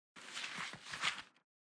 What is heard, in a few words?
Cloth movment